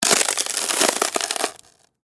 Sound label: Impact Ice Sheet, Crack; Cracking; Objects